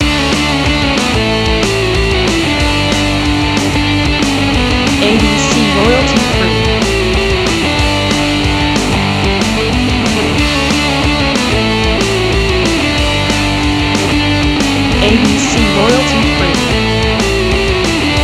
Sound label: Motivation Punk